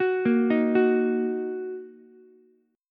A sharp Suspended 4th Sharp 5th A sharp down Ukulele; arpeggiated; JGuitar